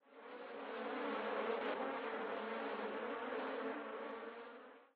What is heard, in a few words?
bees, summer; ambient soundscapes; dota